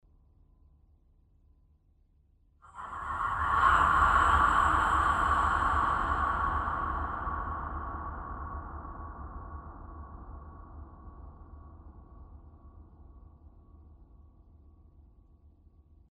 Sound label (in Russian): so svistjashhim jeffektom